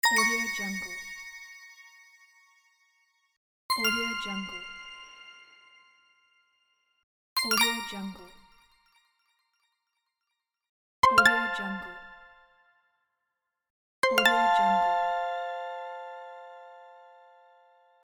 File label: ding notification